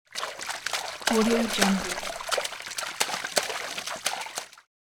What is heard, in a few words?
splashing around in shallow water